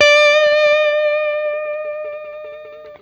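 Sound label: blues long vib, Guitar; 90 s Sample CDs; Quantum Leap - Guitar & Bass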